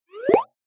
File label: dragon Reveal; 1casino new years dragon